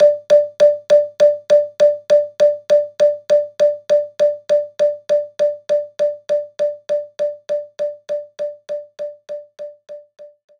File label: Organ Perc A1